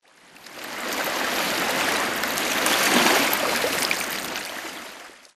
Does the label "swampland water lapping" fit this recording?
Yes